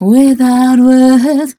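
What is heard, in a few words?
female 137 mel d, Vocals; Mutekki Media - Diamond Vocals 2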